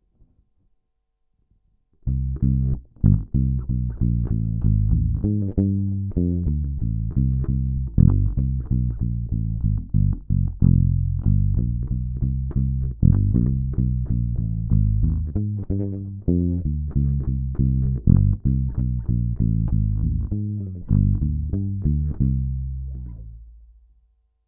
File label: Muted Thumb